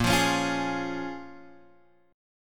Bb Minor 7th Bb, Guitar, Open G

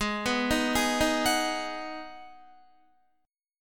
Ab Minor 7th down Guitar; Abm7; arpeggiated

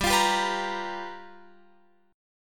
G 7th Sharp 9th G chord, Guitar Open F; G7#9 strummed

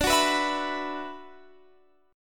D 6th Add 9th Guitar Drop D

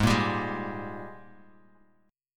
G sharp Major 7th Suspended 2nd, Bass Standard; 16,13,17,13; JGuitar

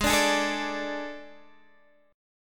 A Major 7th Suspended 4th Ab

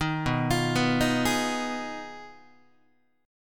B 6th Flat 5th, down, Guitar; B6b5 arpeggiated; 4A,4E,5A,5D,5G#,6C#; chord shape; 6,7,8,9,7,7; JGuitar

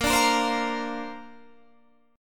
A sharp Major Add 9th, Guitar Standard; strummed

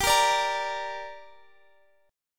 G Minor G down Guitar Drop D, chord

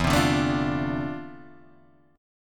D-Minor 7th, E; Dm7/E; strummed

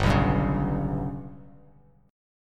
G Major Flat 5th Bass Standard, chord; GMb5 strummed; JGuitar

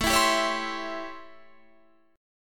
A 7th Sharp 9th

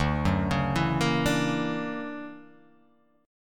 D Augmented Major 9th down, guitar; D+M9 arpeggiated; JGuitar

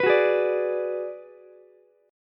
G sharp Minor 7th Flat 5th G sharp down Ukulele; G#m7b5 strummed; JGuitar

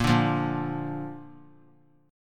A sharp Major; strummed; JGuitar

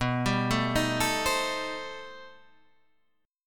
B Augmented 7th Flat 9th B; arpeggiated; B+7b9; 1,3,sharp5,b7,b9; JGuitar

